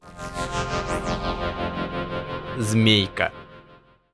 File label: Chat LOL; SNAKE